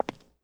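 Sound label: Walk Concrete; We Happy Few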